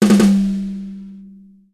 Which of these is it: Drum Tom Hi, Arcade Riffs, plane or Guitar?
Drum Tom Hi